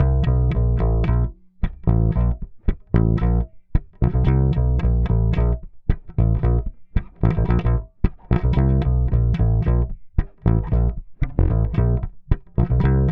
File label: 112 Cm DISCOELECTRO BASS; 112 BPM